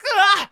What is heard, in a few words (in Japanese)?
guwa, shonen